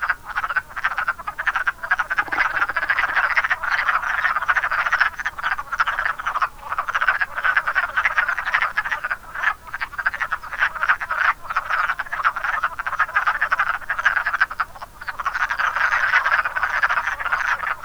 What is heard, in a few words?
wood frog; Wood Frogs 2009, version 1